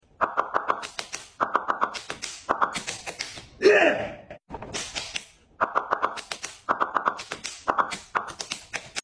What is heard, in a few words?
cat slaps himeself